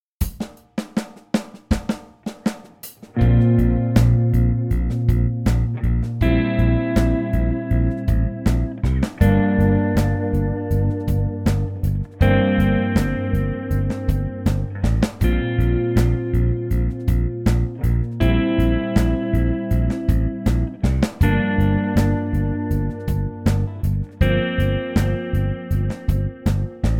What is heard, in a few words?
lick backing